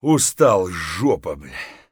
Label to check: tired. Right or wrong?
Right